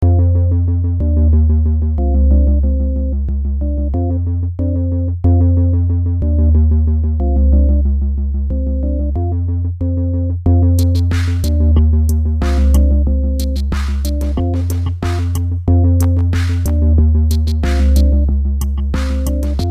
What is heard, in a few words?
relaxed robot theme